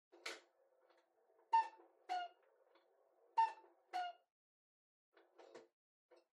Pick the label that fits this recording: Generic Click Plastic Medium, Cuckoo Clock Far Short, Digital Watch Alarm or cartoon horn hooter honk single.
Cuckoo Clock Far Short